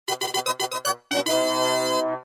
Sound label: race fanfare